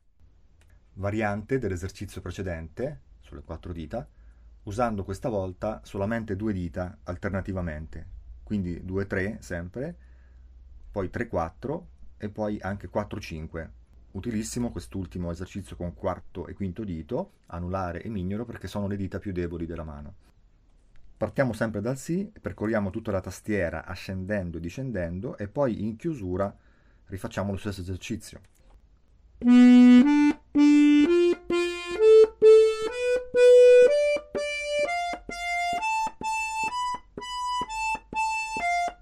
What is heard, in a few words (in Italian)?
fisarmonica esercizio fisa bitonica